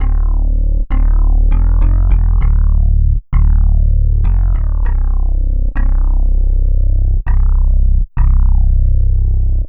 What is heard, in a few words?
Synth Bass; Bass X